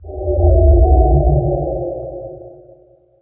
Water Bad

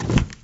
Wooden Floor Medium, shagov

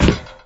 Metal Medium, shagov

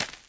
Dirt Light Medium, shagov

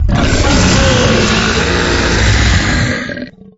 Ghoul Death; Dungeon

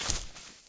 Grass Light Medium, shagov

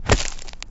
Mountain Rock Light Med, shagov

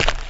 Cobblestone Medium, shagov

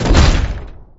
Door Wood Slide Giant Stop

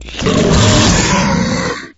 Dungeon Ghoul Wound

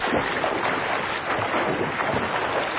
swimming loop

